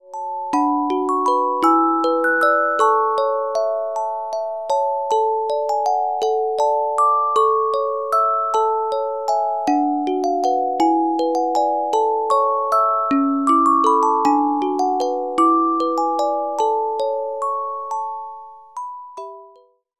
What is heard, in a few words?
Carillon Music Box Melodies